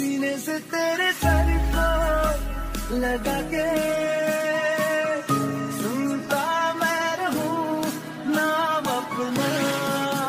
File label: hindi ringtone; bollywood ringtones